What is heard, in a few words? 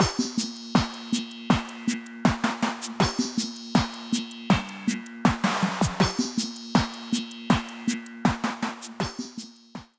adding swing no swing